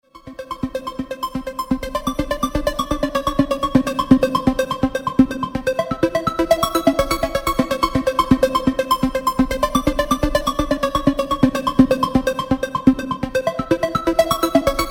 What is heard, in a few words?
SYNTH